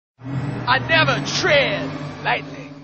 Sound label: siege tank tatararat